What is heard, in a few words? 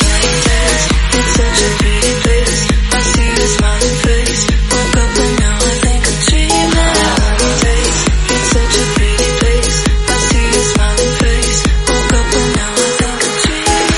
Snippet 134bpm Abm